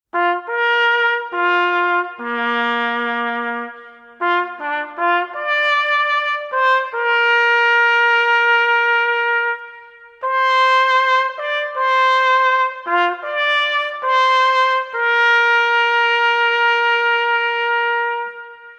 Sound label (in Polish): hejnal; witkowo